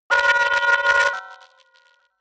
pitch jump rumble short, sciene fiction

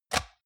metal device click lock in; industrie-wirtschaft

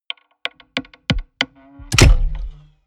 mechanics large lock; industrie-wirtschaft; mechanik

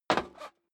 step boots single metal dry single stair up; Footsteps; schritte

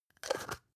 spice jar scratch close soft; industrie wirtschaft; gastronomie

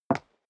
step leather shoes wood parquet single left; schritte

